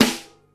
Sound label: classic treble heavy rap steel snare drum sample a sharp key